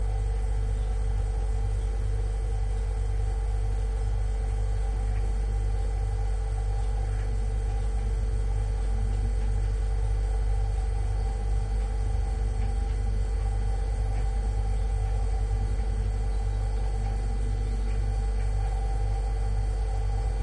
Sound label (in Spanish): electrico